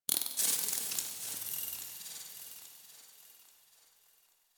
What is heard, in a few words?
Ship Metal Movement Texture Small, Ambience; Warframe; Corpus Ship Remaster